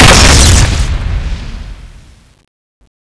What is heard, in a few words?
warhammer hit wall; cstrike; zp knife menu